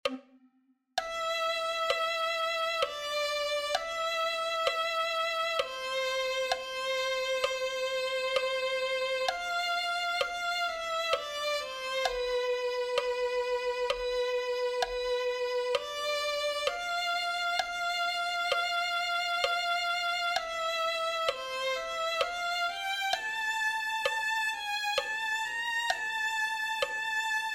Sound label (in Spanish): afirma nuestros pies violin